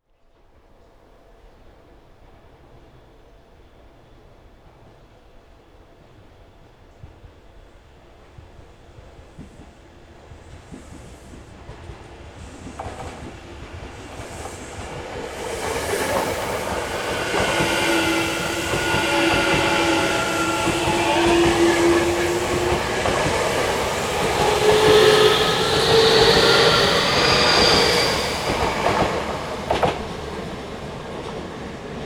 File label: train